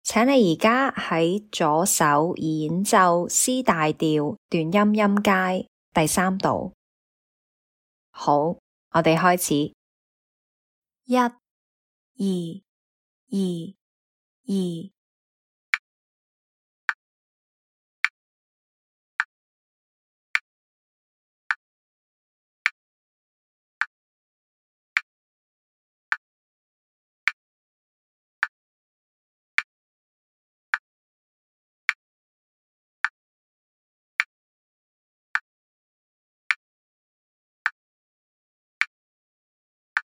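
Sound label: C major staccato scale in 3rds left hand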